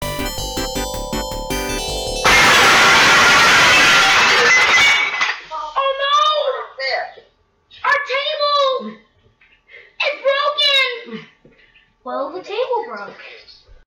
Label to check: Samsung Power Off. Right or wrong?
Right